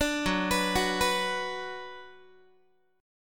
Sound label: F sharp Suspended 4th Sharp 5th Guitar, chord; arpeggiated; 17,14,14,16,15,x